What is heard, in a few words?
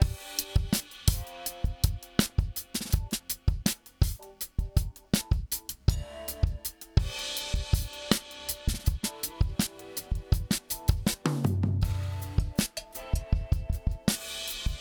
Zener Bender DRUMS COMP; Softube Chandler Limited